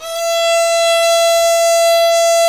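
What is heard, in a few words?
violin tenuto E 4; AKAI S-Series CD-ROM Sound Library VOL-7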